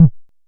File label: analog pitched, Kickbank; Ableton Foundation FreePacks